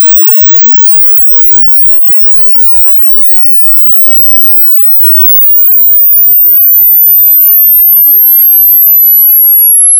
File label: High Sweep; Acoustics